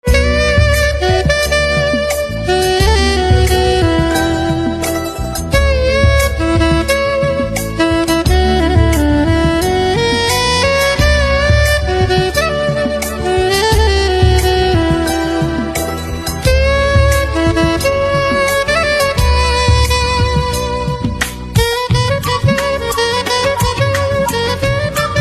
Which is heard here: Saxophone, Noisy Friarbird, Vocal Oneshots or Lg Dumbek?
Saxophone